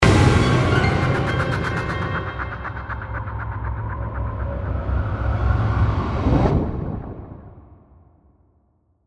Composition Stab, Horror, Composition, Stab, Piano, Chopper, Whoosh, Eerie; Scary Soundscape